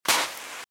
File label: Footsteps Sand Dry Walk Left; RadwinIsland